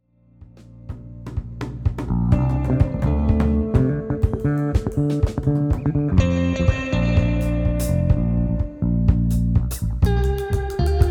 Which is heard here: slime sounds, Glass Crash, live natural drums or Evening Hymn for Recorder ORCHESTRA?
live natural drums